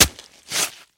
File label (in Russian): demonstrativnij razriv vazhnih dokumentov